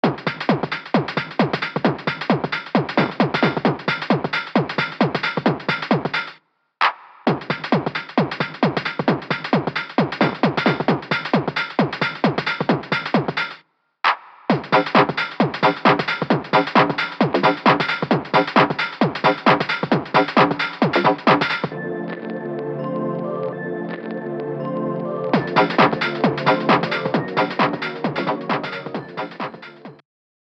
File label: BoomBox loop